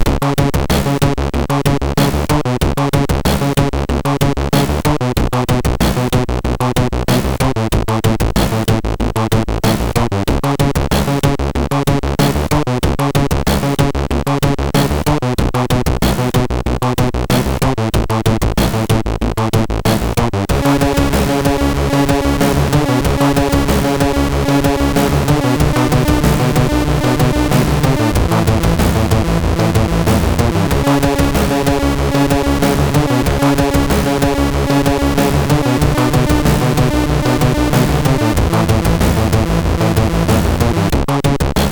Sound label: Trance sid MOS6581R3; Astovel; hvsc